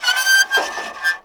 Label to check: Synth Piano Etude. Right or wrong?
Wrong